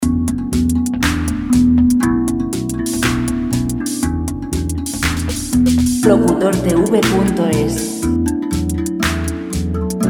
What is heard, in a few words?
120 chill; chillout; musica audiovisuales